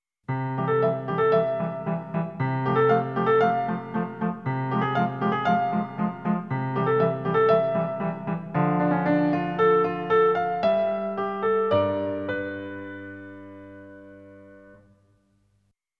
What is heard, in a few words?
Castle Clear BGM; NINTENDO; Little Koopa Castle Clear Demo BGM